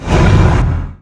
rolling boulder cast; weapons; dota; earth spirit

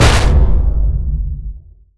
enchant attack; weapons; dota; earthshaker

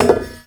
weapon drop common

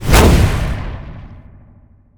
tower projectile; damage; player; physics

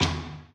store back, ui menu

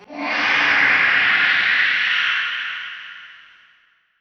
reapers layer; dota; necrolyte

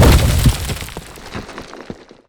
elated impact layer; weapons; dota; dawnbreaker

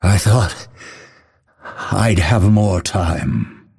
death; dota; phantom assassin

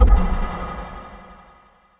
projectile; weapons; arcana; dota; earthshaker

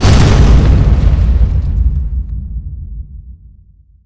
echo; dota; earthshaker

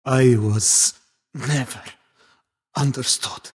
death; skywrath mage

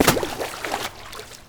frog stomp splash; weapons; dota; largo

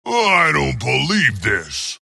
death long; dota; skeleton king

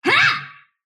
sylph attack short; dota; dark willow

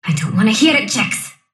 sylph death; dota; dark willow

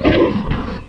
grunt; snapfire; mortimer

